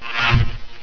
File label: Button Hilite; Greebles 1.0